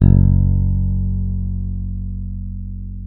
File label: F#1, FINGER MUTE; Xsample VOL-1